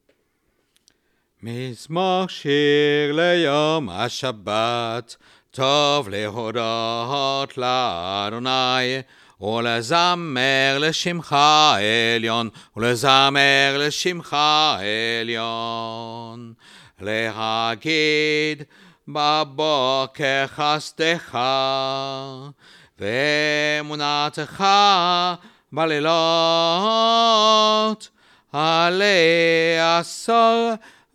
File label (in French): Psaume 92 Mizmor Chir